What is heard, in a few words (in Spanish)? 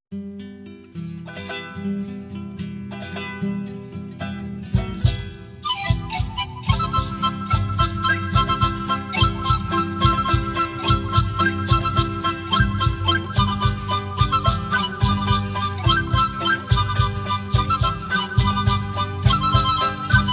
zampoñas y charango; peru